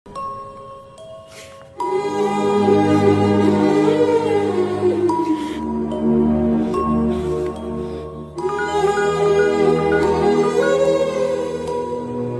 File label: sad bgm ringtone; premam; chitralahari movie; sai dharam tej; kalyani; south indian movie